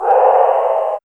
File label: HOWL; E-Lab; X Static Goldmine 1